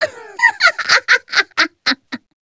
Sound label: succubus laugh long; queen girl